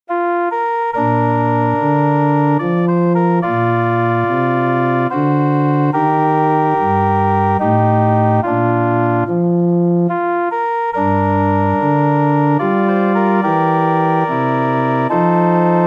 Amazing Grace (Horn Solo + Orgel-Playback)

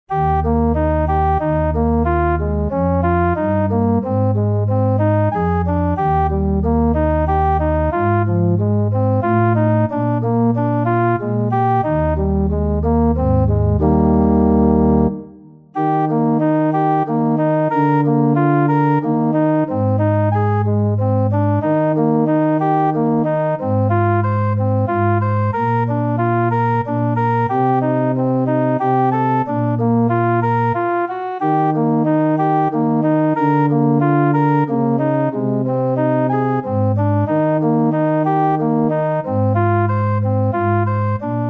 Zum Sanctus (Heilig heilig) (Saxophon Solo + Orgel-Playback)

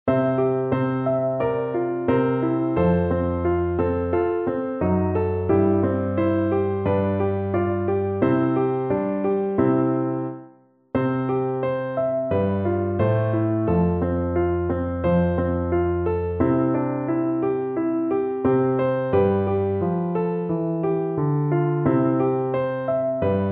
Naeher mein Gott zu dir (Oboe Solo + Klavier-Playback)

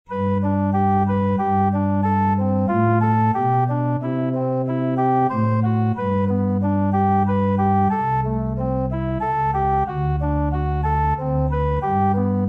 Zum Sanctus (Heilig heilig) (Querfloete Solo + Orgel-Playback)

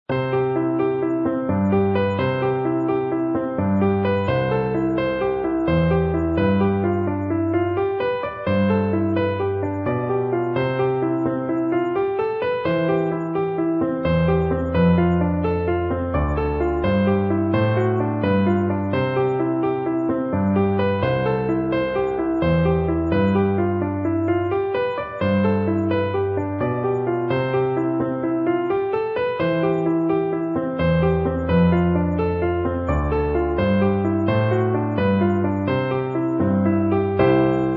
Happy Birthday (Klavier bewegt + Floete)

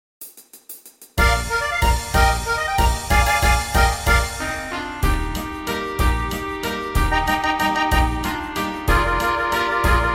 Schneewalzer

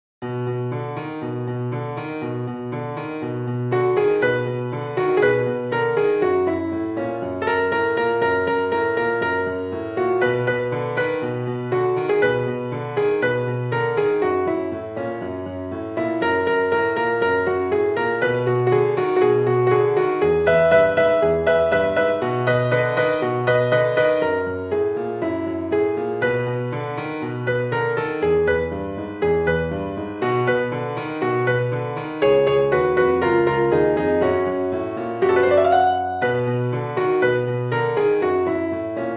Arrangiert fur Jazzklavier

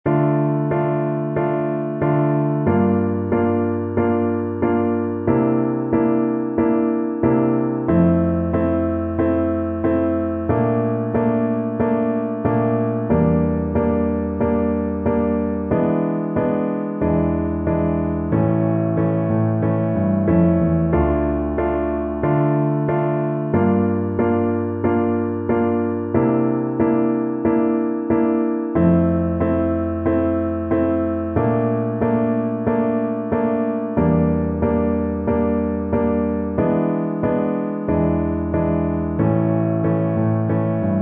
Violoncello Solo + Klavier-Playback; Ave Maria

